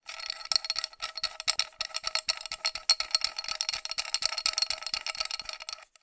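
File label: industrial metal nut turn loose on pipe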